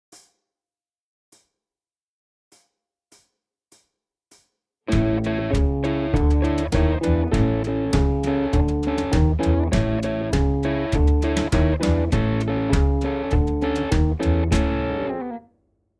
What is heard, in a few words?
Chicago Blues Guitar; Chicago Blues Guitar Lesson